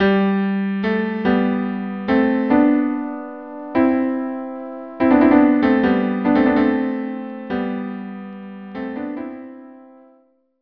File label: cello duo; pifa